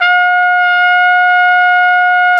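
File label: RCA trumpet, jazz; Solo Brass; Trumpet 5-7; Tp 5 RCA Jaz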